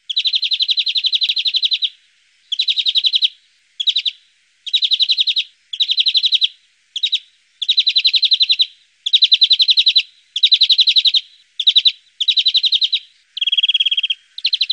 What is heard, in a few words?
greenfinch